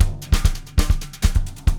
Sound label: BR SMBA WU, drum